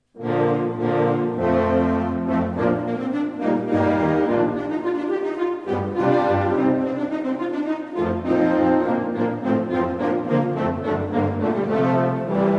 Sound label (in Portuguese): Marcha Militar Francesa, banda de música; banda de música da região militar do norte; concerto de gala